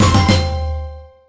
Result post jingle bad

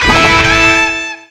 Note Spreads Perfect; RockStar

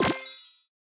Big Coin; Jelly

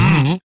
slide

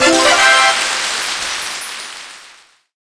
Quest popup reward

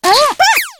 Throw Letter Combo; Candyfloss

